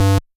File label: bass